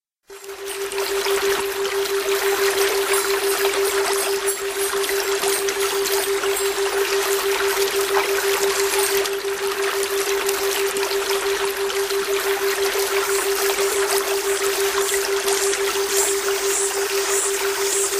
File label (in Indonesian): Suara Air Mengalir; untuk Terapi Burung Macet Bunyi